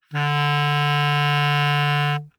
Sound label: Bass Clarinet ff D3 stereo; Woodwinds